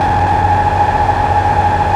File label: Aud Wall C#4; Digital Sound Factory Xtreme Lead